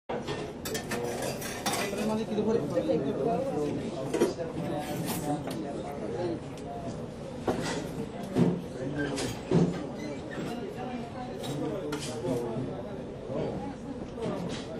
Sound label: diner; WMBonusXP